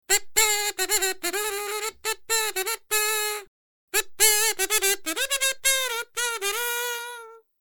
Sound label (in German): Kazzoo